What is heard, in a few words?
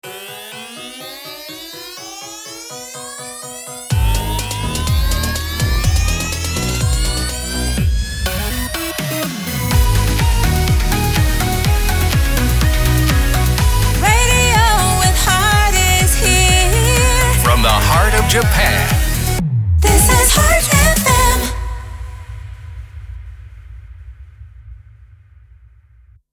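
Sung Jingles C major 124bpm RAMP; 79.5 Heart FM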